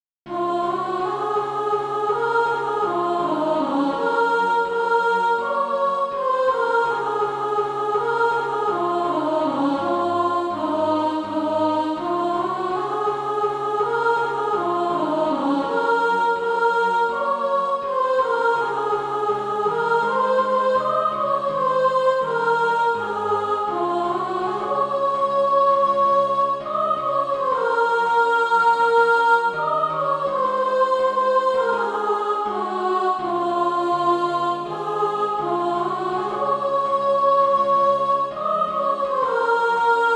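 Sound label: soprano, Practise Music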